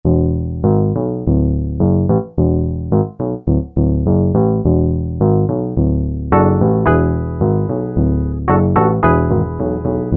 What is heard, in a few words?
Bass, Alesis Z4 Vintage Keyboards